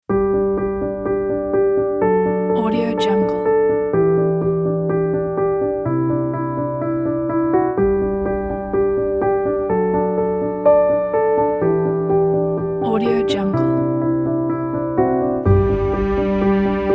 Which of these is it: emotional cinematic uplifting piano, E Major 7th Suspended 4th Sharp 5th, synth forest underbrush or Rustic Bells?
emotional cinematic uplifting piano